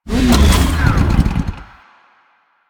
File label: Dismount Moving, Motorcycle; Motorcycle Ninety Nine